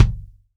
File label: RBD MF ATT, ROCK KICK